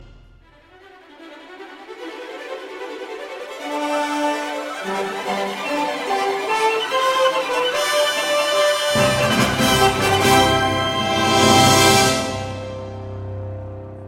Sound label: Orchestral Iceberg Flourishes